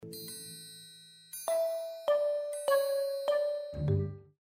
samsung s9 mini sms ringtone

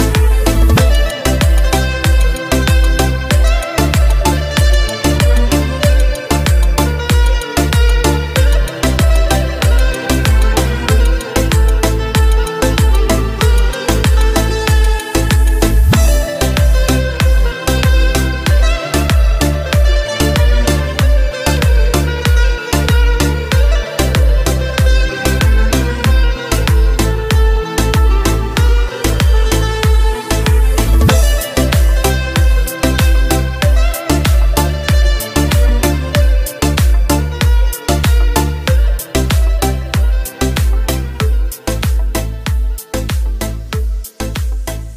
Ringtone Arabic Music

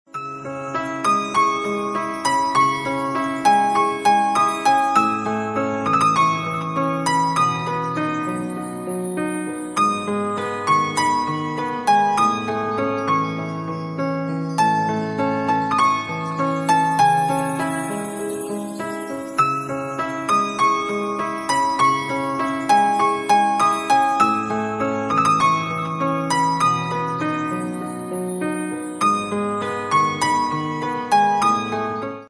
new piano music ringtone

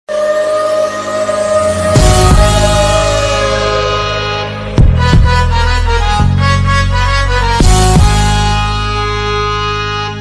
Kelp Forest Music Ringtones

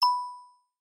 Notification; Xiaomi Redmi Note 12 Pro+

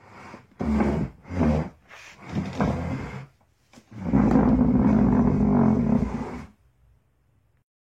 Table Moving Sound

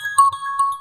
Infinix Notification Ringtone; Infinix Note 8i

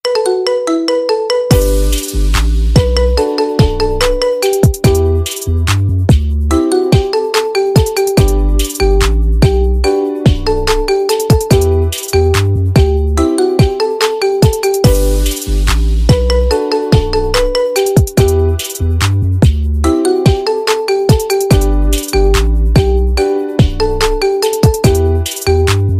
iPhone 11 pro BBI BBI Ringtone

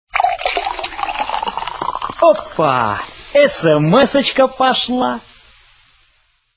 Samsung Galaxy Sms

